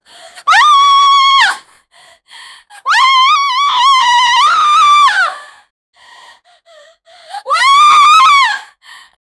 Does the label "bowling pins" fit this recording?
No